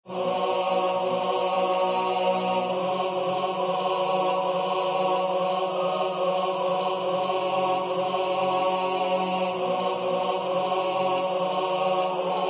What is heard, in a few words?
tenor; Yndigt dufter Danmark